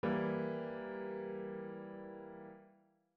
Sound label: E dim triad